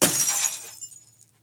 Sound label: Glass Break; Treasure of Nadia